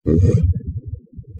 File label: pit drone idle, goblin; svencoop; legends